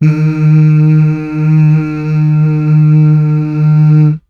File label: MMHH